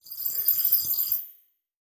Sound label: Production Element Title Transition Liquid